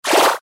Flash Animation Multimedia; Web Interactive; Technology; Buttons; Blastwave

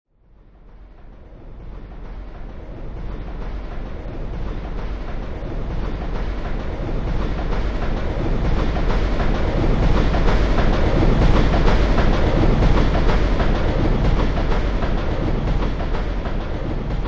Train Freight Pass By; Train Sound Effects; Vehicles; Lynne Music

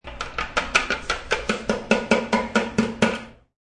Bang of a plastic container; Plastic Container Bangs; Kitchen; Household

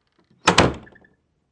Door Slam, Single door slam.

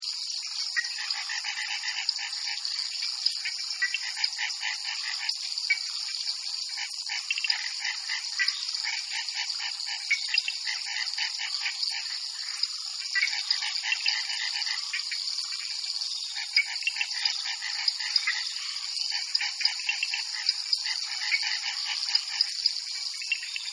Amazon Night, Forests And Jungle Sound Effects; Nature Scenes; Backgrounds; Partners In Rhyme

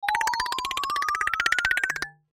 Cartoon Mallets Pitter Patter Ascend; Cartoon Music; Cartoons; Blastwave

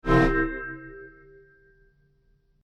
Created surge; Rises and Dives; Sound Design; Various sweeps, pulses and drones

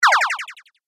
Game Laser Pulse, Video Game Sound Effects

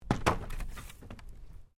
Wood Planks Fall

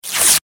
Office Index Card Rip Tear Cardboard; Ripping; Objects

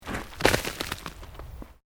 Earth Loose Move Short

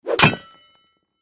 Sword Impact Rock Stone; Warfare; Swords (Sound Effects:Combat); Blastwave

